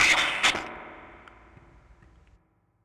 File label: FX Spring Tchomp; Polyend; Legowelt